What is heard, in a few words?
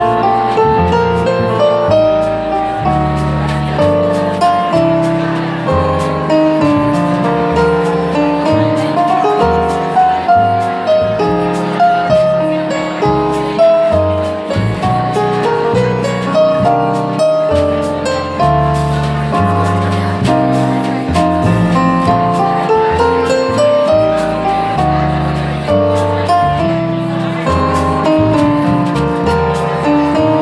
Jazz Trio